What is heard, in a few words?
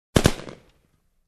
Two machine gun shots